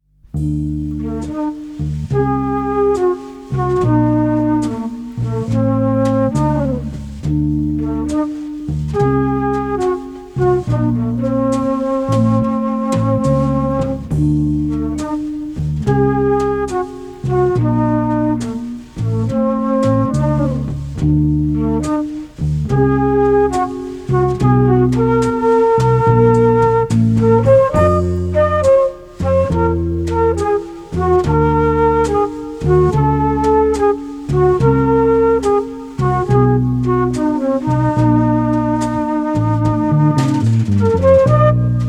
a flute in the night

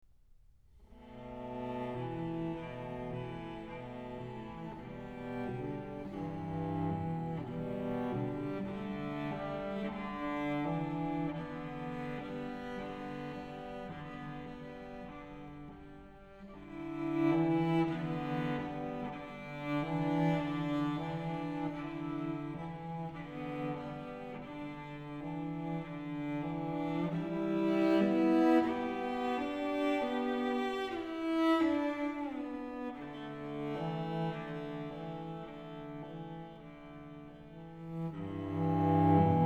suite for solo cello

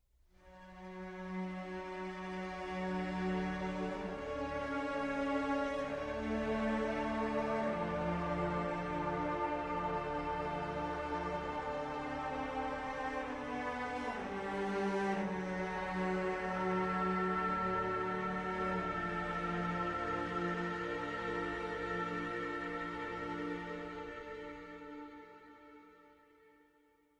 ambient

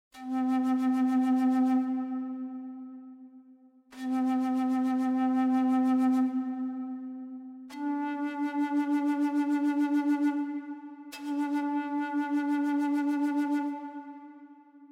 native american flute, wind

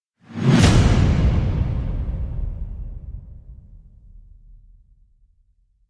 metallic slams with whoosh; vengeance

